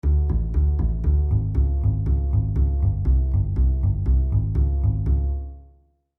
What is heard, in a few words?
bass lick; instrumentos; baixo; licks para baixo